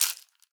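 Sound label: rattlebone, percussion; ILIO; Synclavier Percussion 2; World & Orchestral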